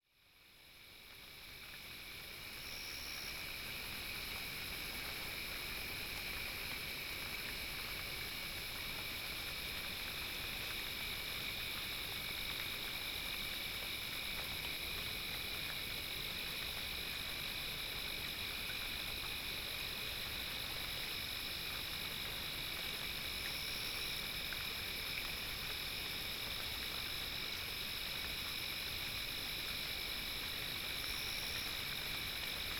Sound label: Rain Crickets; Insect Lullabies